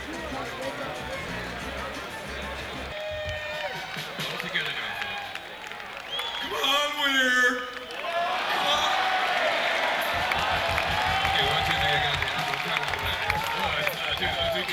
Crowd Tuning; senn421

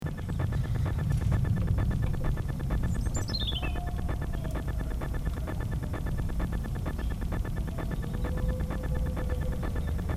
Guitar And Birds

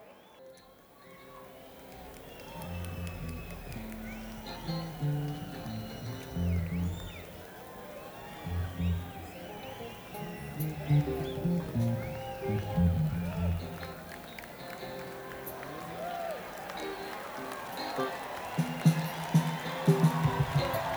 Crowd Tuning; akg451; neumann u87

